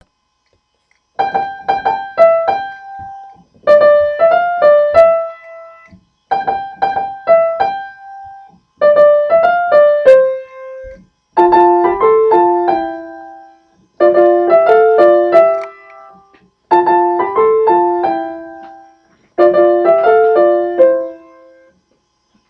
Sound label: andes piano